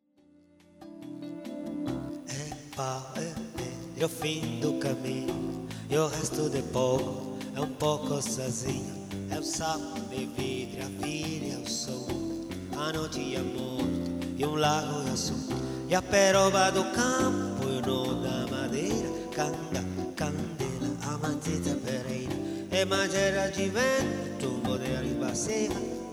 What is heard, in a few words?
PIANO BAR